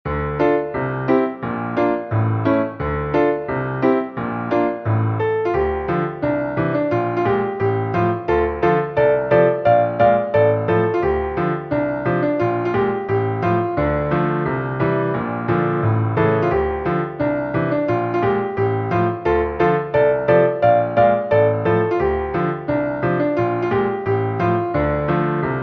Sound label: piano